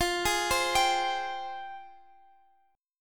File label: F Minor Add 9th F; arpeggiated; guitar; JGuitar